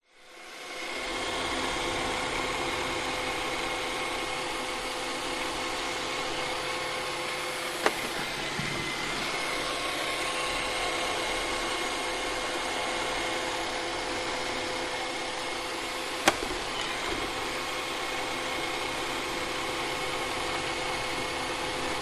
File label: household vacuum cleaner robotic cleaning hard wood floor off